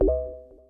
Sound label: confirm